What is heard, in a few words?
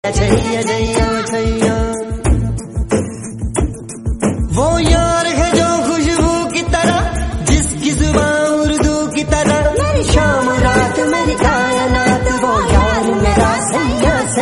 hindi dj remix